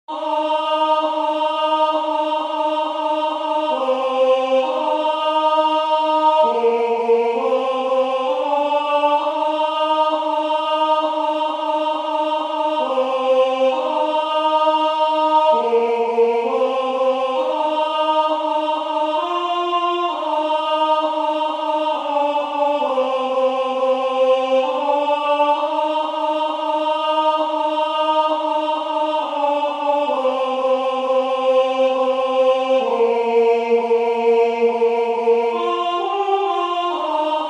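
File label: Tenor; Durufle; Ubi Caritas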